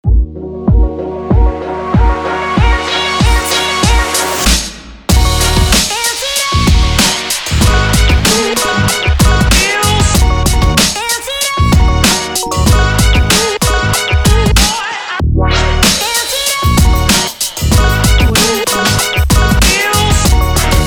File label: hip hop on